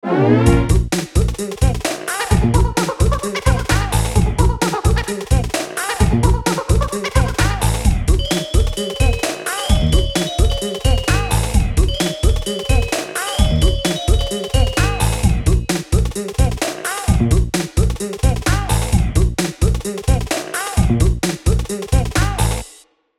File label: March clear; donkey kong country 2 fan remastered; Krook's March; diddy